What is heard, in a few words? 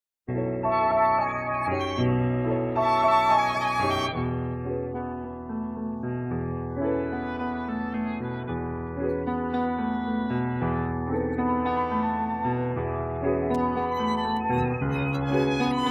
Instrumental Track; auditions